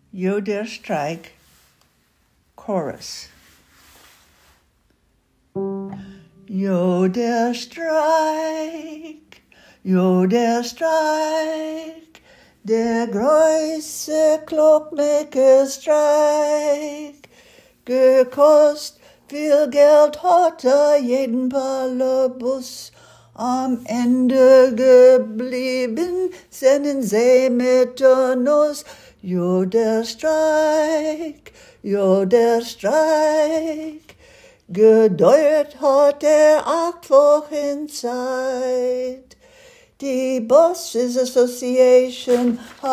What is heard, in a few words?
chorus alto; Cloakmakers Strike